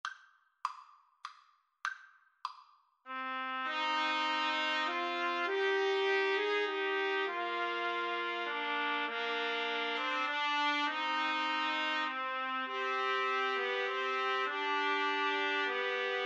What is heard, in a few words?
greensleeves, Trumpet Trio